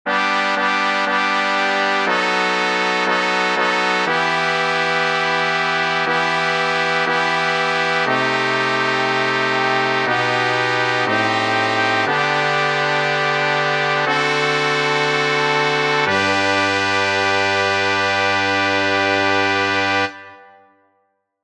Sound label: Many Brave Hearts, All Parts, barbershop tags